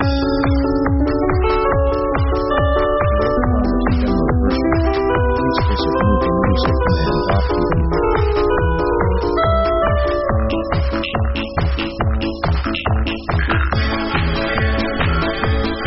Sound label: house marching fun